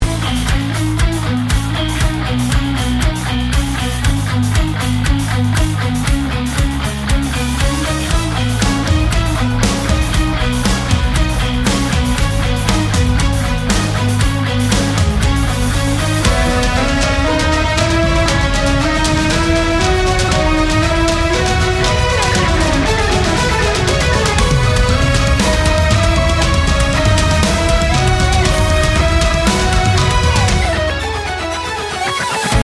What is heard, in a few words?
Instrumental